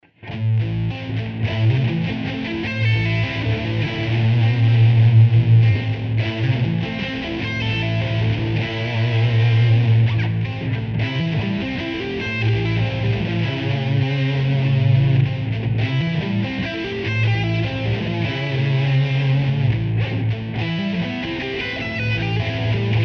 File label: arpeggio, guitar; guitar arpeggios part 1